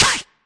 machine, Machine Electro Hits; Hip Hop; STYLE KITS (Hip Hop, Etc)

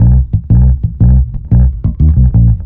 bumpy bass

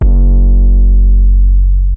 SuperCharged 808 long; drums single hits; Khezie 808s

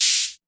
Hats, Roland T6; DRUM MACHINES

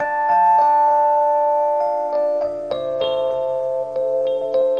christmas rhodes; KEYS ORGAN PIANO

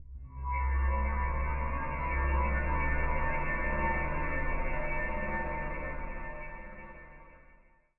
asteroid cloud, Atmospherics

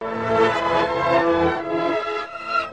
reversy orchestra; ORCHESTRAL and CLASSICAL

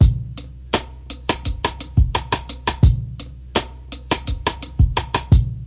classic brk; VIBRIS CLASSIC BREAKS

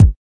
kik

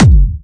electro kick; Electro Kit; STYLE KITS (Hip Hop, Etc); Boy In A Band Electro Kit

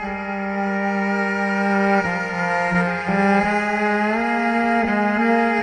verby cello hard melody; MELODIC LOOPS; STRINGS ORCHESTRAL CLASSICAL LOOPS

